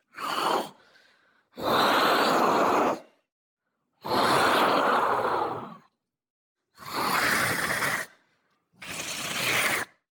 Female Ragging Creature, Monster Zombie Vocal; Coll Anderson

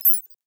Beeps Tiny, High Tech Interface Sounds; Lesser Vibes

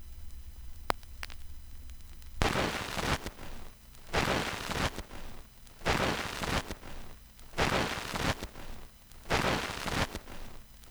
vinyl crackle; 99Sounds; Vinyl Noise SFX

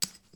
chips Stack, Casino; Kenney's Sound Pack

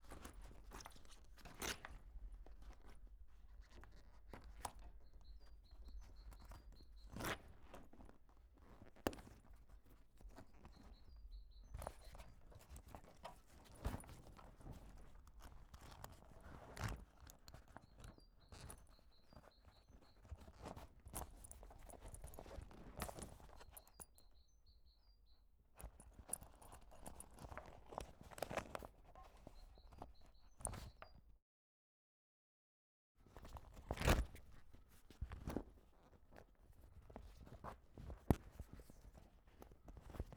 Mercedes L3750 Cargo Tarp Belt Exterior; 1945; MKH8060; Pole Position

